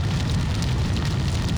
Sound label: torch; hawaii